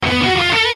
short, sms

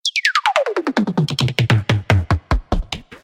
electro loop, ringtones

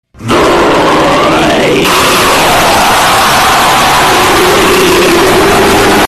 Void Screamo meme

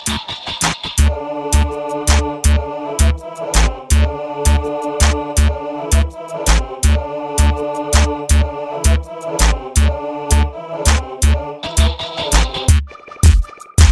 Riddim Instrumental

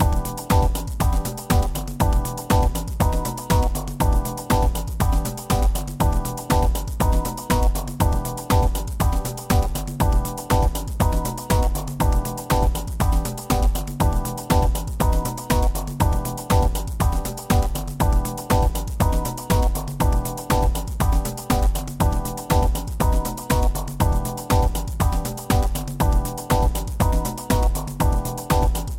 House Ringtone

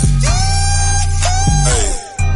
YEEHEE

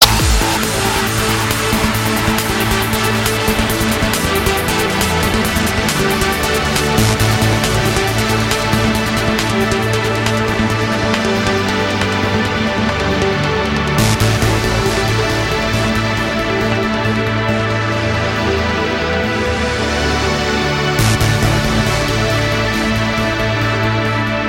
trance, ringtones electronic

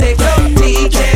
thunder giant

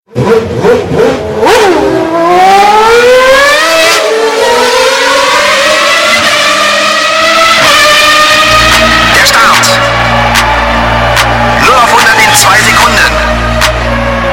techno, ringtones

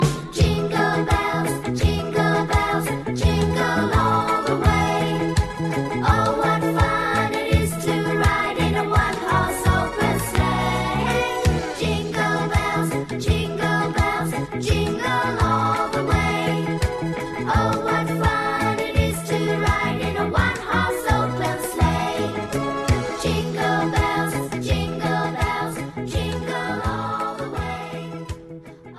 Jingle; Mini Pops

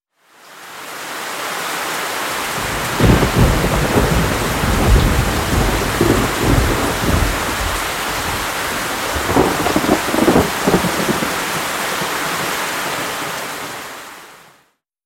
storm and rain

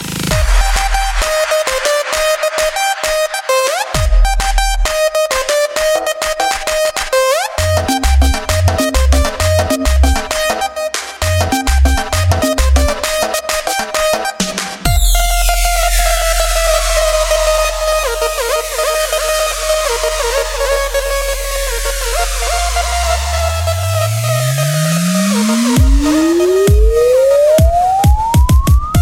tribal, ringtones electronic

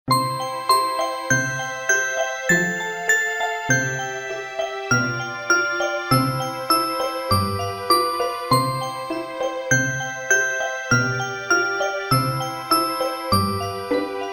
twinkle twinkle tone; children

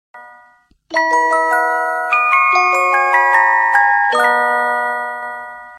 Flourish

